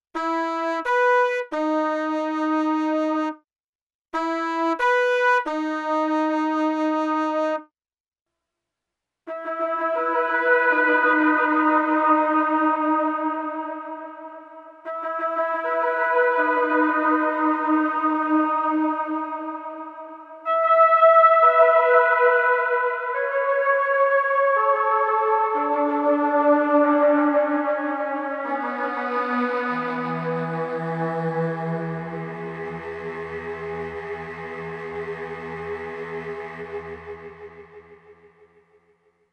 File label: allpass detune